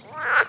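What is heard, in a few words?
pond frog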